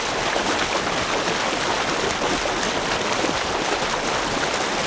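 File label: water pipe; Star Wars Knights Of The Old Republic I; XBOX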